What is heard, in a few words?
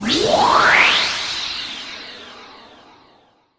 Train Away; Pac-Man Championship Edition 2 Plus